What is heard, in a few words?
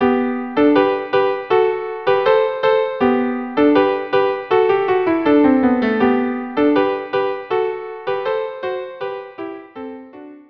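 viola duo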